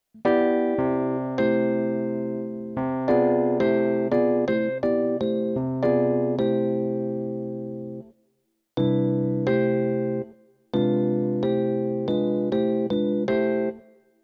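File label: dim chord, jazz piano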